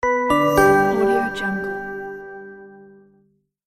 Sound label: bright logo